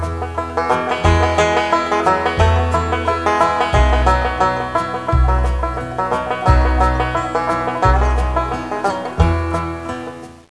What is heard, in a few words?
oldtime, banjo